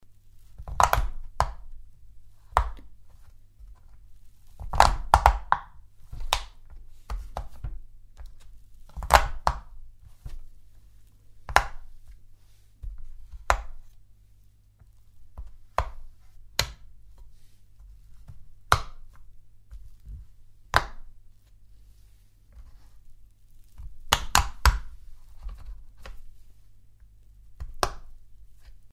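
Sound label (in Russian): звук поп ит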